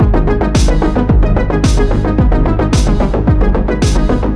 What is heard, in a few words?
Electro